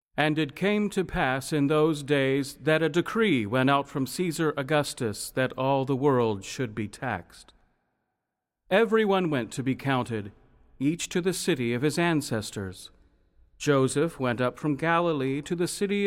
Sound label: satb